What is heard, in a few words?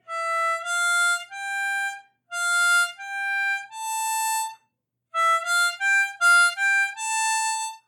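C Major Triplets Ascending, harmonica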